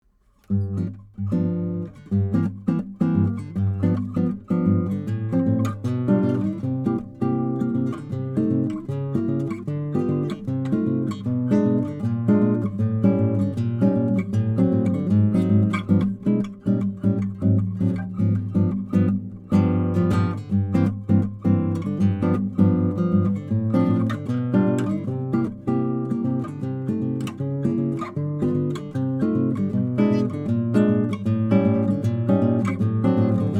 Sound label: Jazz, Guitars; Jazz Blues; Jazz Standard; Cathedral Guitars